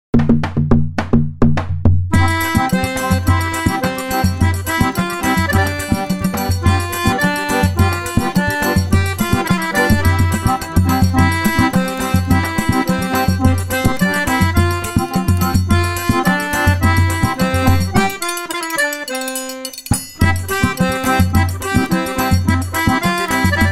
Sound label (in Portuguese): sanfoninha instrumental